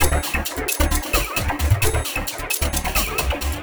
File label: 132 Dance Combo Dmaj; Dance DJ; Pro Tools Essential Loops; Digidesign